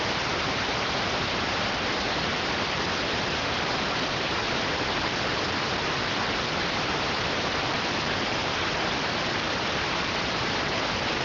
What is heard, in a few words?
rtty rx